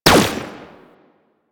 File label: Deth Cube Prime Fire, weapons; Warframe; Sentinel; Deth Machine Rifle